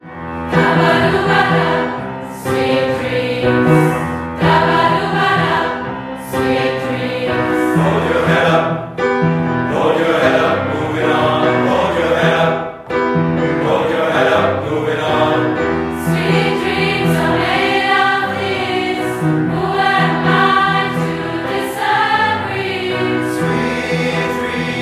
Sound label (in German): 4-stimmiger Kanon